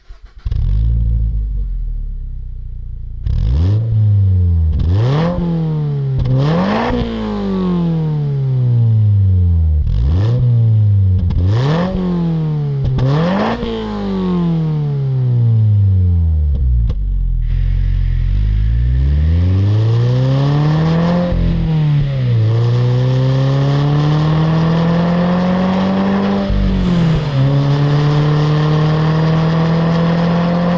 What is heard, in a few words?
Subaru Sound, Subaru BRZ; Remus Duplex Endschalldaempfer ohne Endrohre